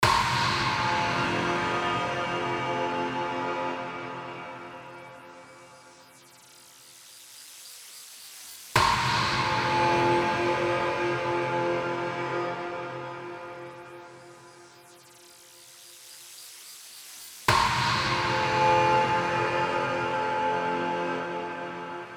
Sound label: TRAILER